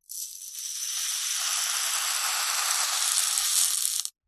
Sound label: leisure toy rain stick ball bearings spiral fall in plastic tube; zapsplat